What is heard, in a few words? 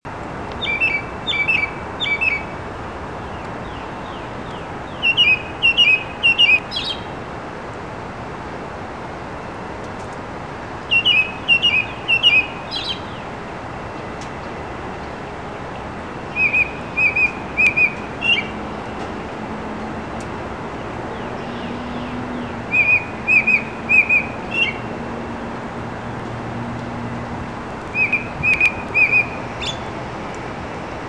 american robin